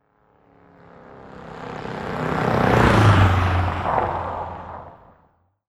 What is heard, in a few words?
dodge passing quickly